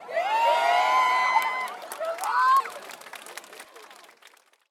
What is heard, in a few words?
people performance